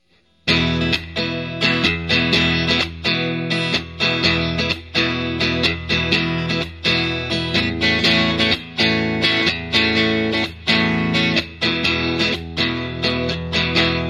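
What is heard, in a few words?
Lightning Middle Clean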